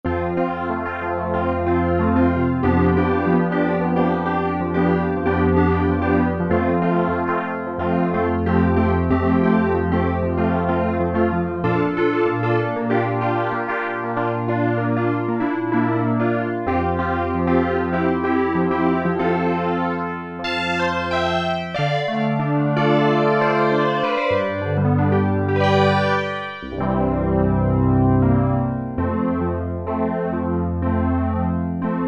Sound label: FLANGED PIANO, Oberheim OB-8; Factory Patches